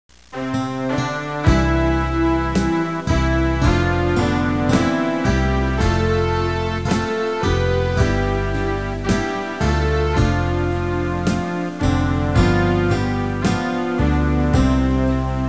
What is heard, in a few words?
BASS